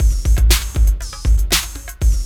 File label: LOOP, DRUM; E-Lab; X Static Goldmine 2; akai